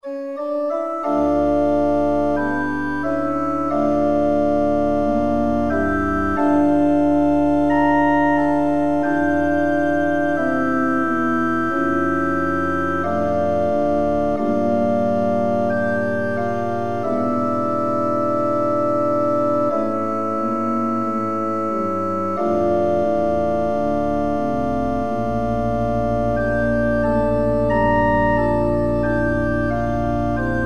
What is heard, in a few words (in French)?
Fond d' Orgue; Marchand; Virtual Virtuoso